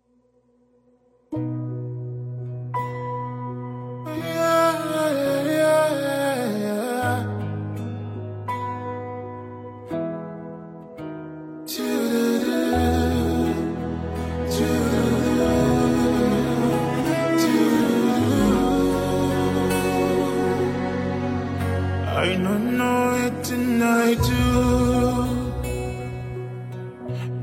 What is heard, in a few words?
Acoustic